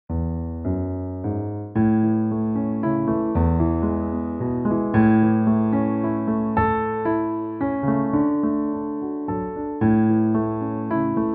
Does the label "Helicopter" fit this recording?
No